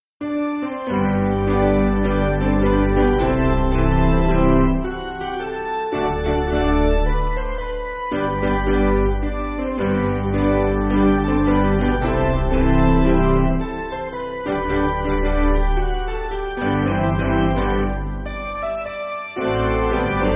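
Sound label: Piano, Music Backing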